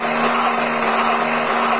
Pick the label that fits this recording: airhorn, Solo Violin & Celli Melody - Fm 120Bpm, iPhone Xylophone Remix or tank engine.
tank engine